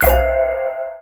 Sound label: UI Magic Confirm; User Interface